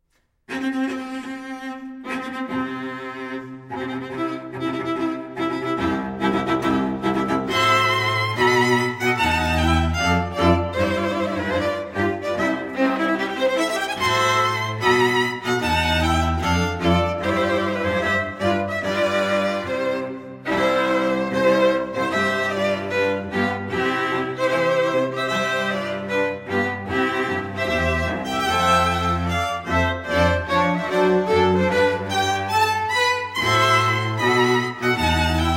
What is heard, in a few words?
Mendelssohn Wedding March